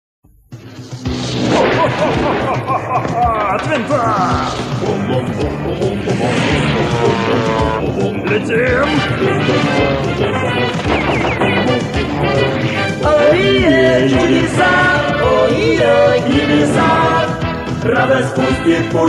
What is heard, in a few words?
Theme Song; TaleSpin